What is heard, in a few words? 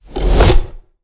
Weapon Load Whoosh